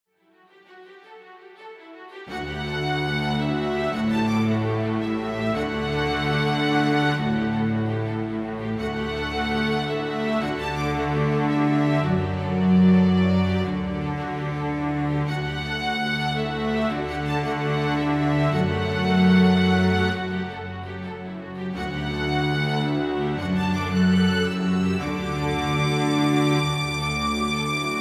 String Orchestra

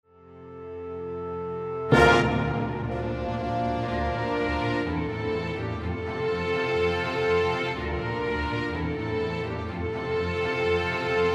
Orchestrated

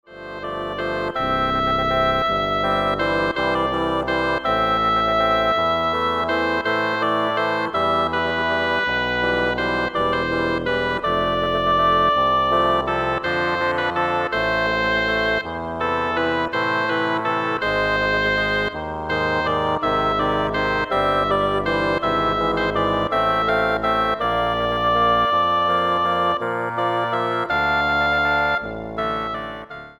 Double Reed Ensemble

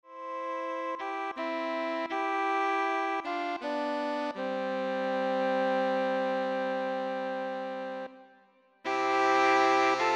Saxophone Ensemble

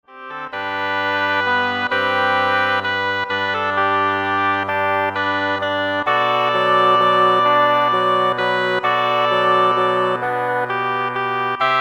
Double Reed Ensemble

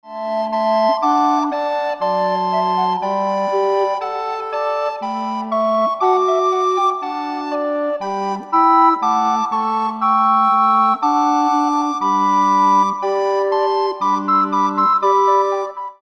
Recorder Ensemble

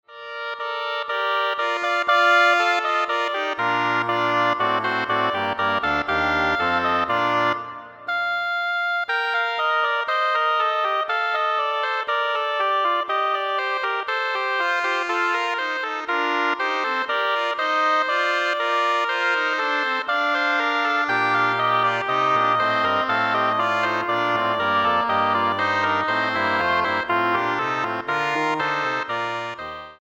Double Reed Ensemble

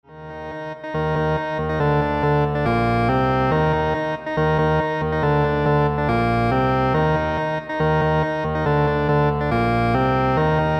Clarinet Choir

